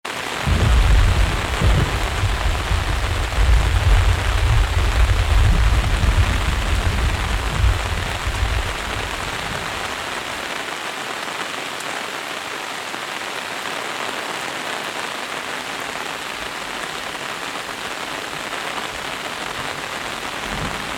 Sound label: Heavy rain on umbrella thunderstorm underneath loopable